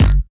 E-Perc Tuned Block High, Electronic Percussion; Drums; Ableton Live 12 Lite; Core Library

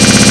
shredder; Genesis3D

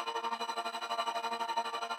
floor switch ending; puzzle

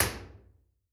Real Places Fox Caves L; Impulse Responses; Fox Caves BA; Ableton Live 12 Lite; Hybrid

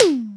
Tom High; One Shots; Drums

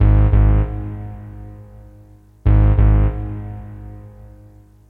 Warning Loop; To the Moon

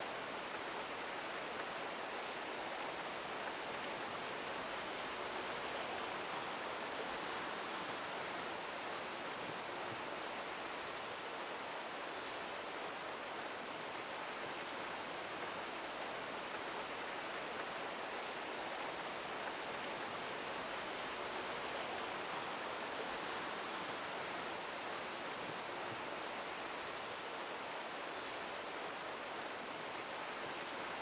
rain medium; background

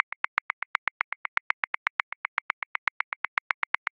Perc Conga Accent 16ths; Grooves; Percussion; Ableton Live 12 Lite; Core Library

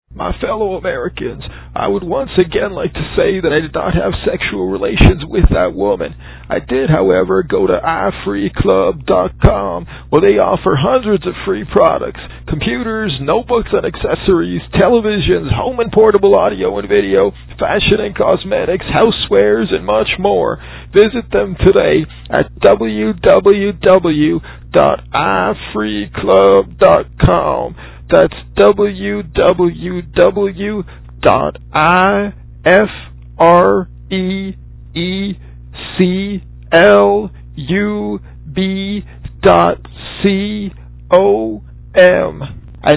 im singing

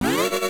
Charging; MMBNMMOP

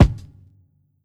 Kick Hat; One Shots; Drums; Feel

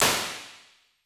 Plates Plate A; Impulse Responses; Ableton Live 12 Lite

